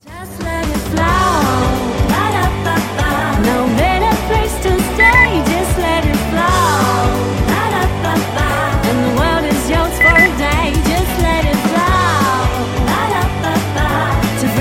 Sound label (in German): gesang beep